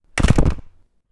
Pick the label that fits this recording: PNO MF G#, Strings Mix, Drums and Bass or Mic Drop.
Mic Drop